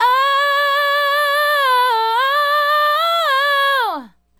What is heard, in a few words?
Female Solo, Hip-Hop and RnB Vocals